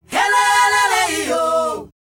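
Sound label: "HEHLELEYO", World Voices South African; ILIO; Vocal Planet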